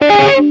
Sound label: audio volume change; jam; stereo